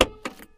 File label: Trunk Open